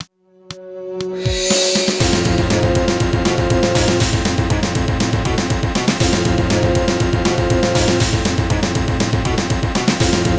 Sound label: city, music; Alien Arena